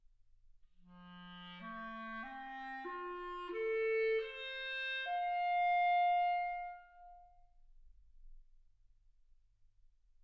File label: clarinet